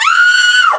krzyk

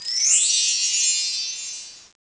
dzwonek wietrzny

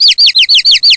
kurczak

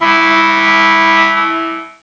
syrena

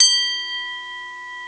dzwon

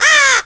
wrona

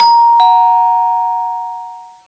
gong tony

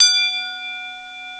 dzwon

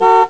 klakson

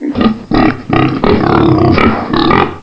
swinia chrum

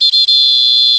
gwizdek

